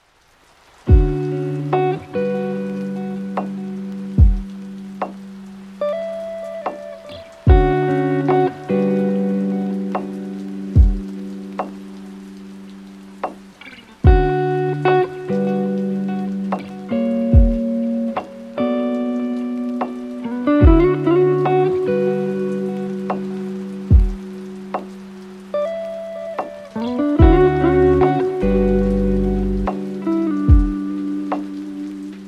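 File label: Rainy Midsummer, Chill Beats, lofi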